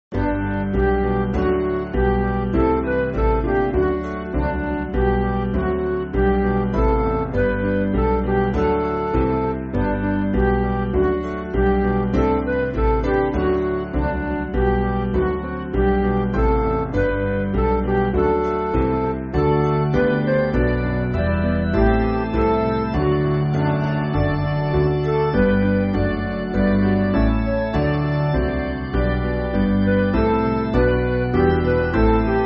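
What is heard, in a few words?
Midi, Backing Files for Churches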